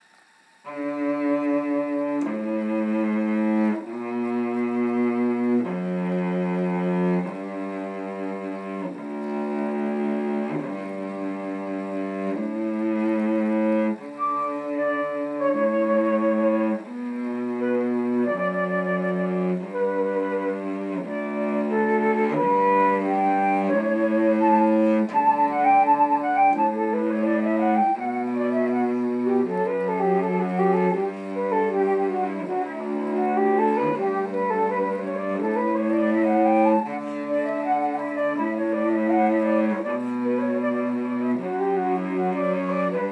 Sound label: Flute Cello DUO; Canon in D